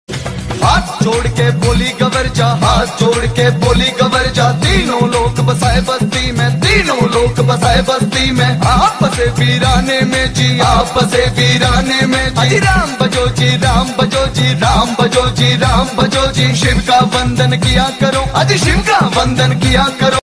Remix

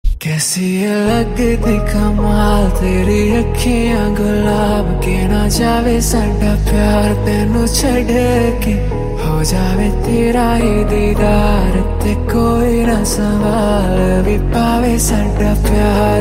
Hindi Song

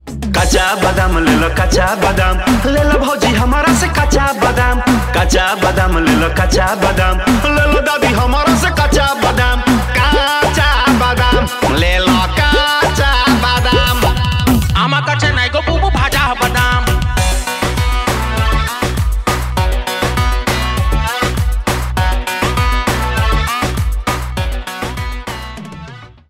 Bhojpuri DJ Song

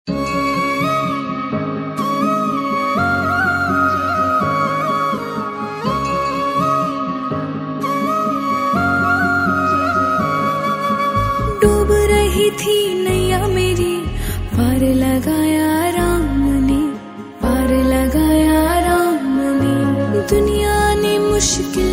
jai shree ram Bhajan

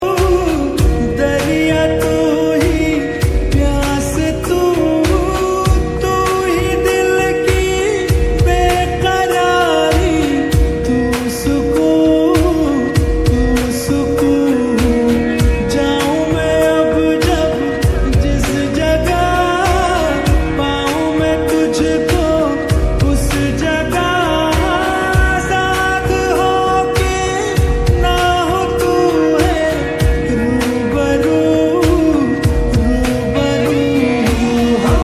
slowed reverb lofi song

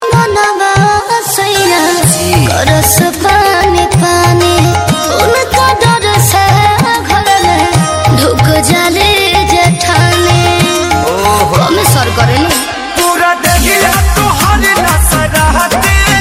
Holi song

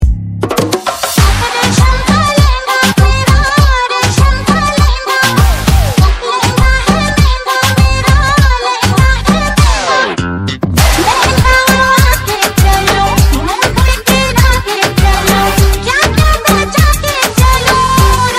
Old Instrumental Ringtone

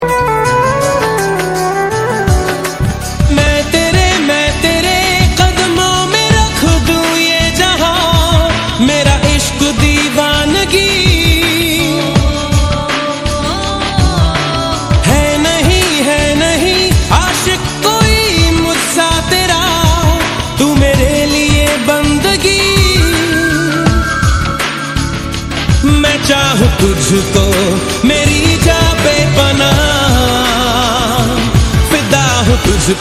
romantic song

